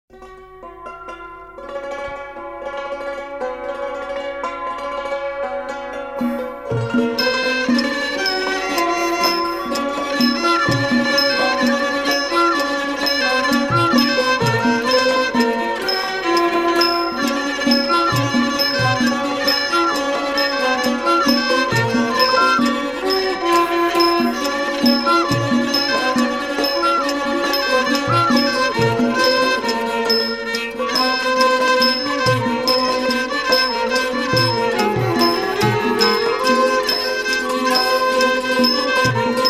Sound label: Thai Classic; Kangkai Kun Gluay